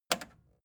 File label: record player repeat jump out click fast